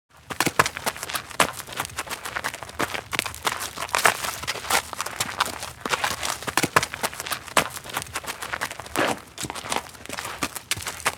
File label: Donkey walking on gravel